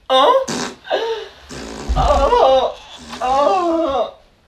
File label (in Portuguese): peido com gemidooo